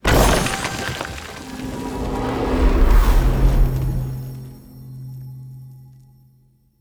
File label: arena; battle